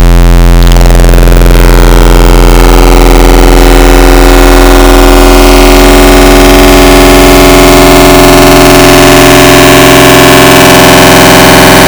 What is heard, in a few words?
Harmonic Square, FM Wavetables; Cymatics; FM Wavetables Vol 2; Serum